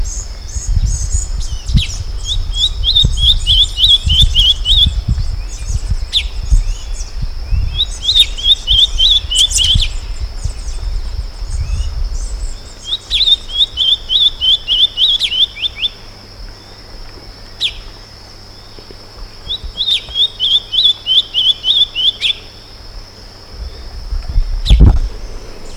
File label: flame rumped tanager ramphocelus flammigerus; xeno-canto